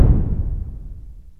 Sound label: ELECT PERC; OMI - Universe of Sounds